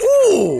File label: "ooh" male; ooh ahh